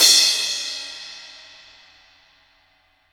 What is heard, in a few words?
MIX CRASH, Crash Cymbal; MONO CRASH; AKAI S6000 CD-ROM - Volume 3